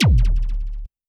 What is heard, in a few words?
Zap, 8-Bit; 8-Bit Kit Volume1